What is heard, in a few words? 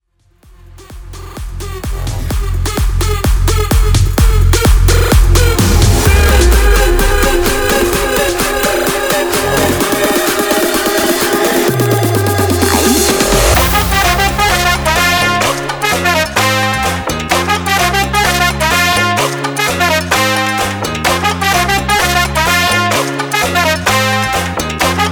Bigroom Mashup